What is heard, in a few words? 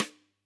K1 close SdSt, drum